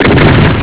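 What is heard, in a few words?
double barrel, shotgun; Doom SNES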